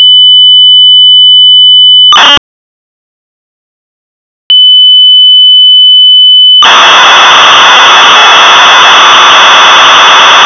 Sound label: SCANNER